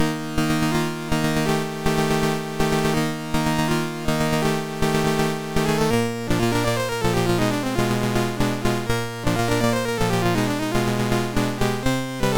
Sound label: Fanfare, MOS6581R2